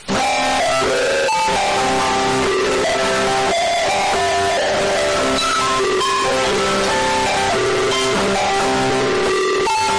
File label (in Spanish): alarma saturada